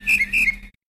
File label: Bird Coleiro